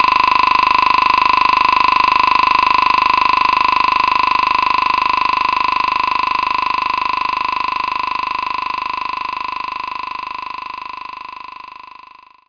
woodblock

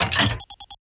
Sci-Fi Weapons-Loading Fx

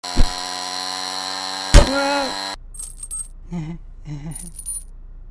Flail; Stealing The Diamond; Medieval Room

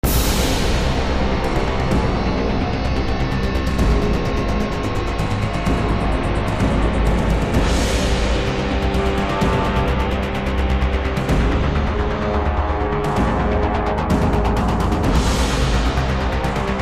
Elevator Ambush Gunship Attack; Counter-Strike Condition Zero

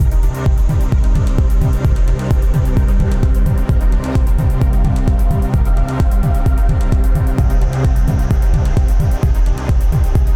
Combat Loop; Megaton Rainfall; PS4

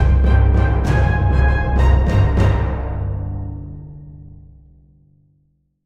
Tension; Racing the Wind 01 - Post Cutscene - Tension B Short

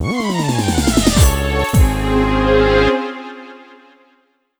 Loss; Super Monkey Ball 2; Monkey Dogfight 2

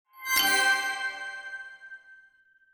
ui quest cup hard

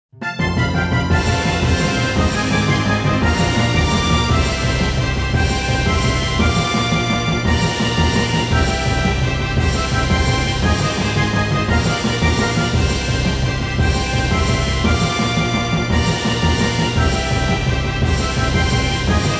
Victory; Tales of Rebirth

